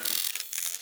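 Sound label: robot mech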